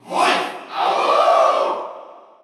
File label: Cheer Russian; Wolf; SSBU